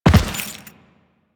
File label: Land Default, Footsteps; Enemies; Warframe; Teshin